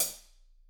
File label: HH, Drums; Bob Clearmountain Drums I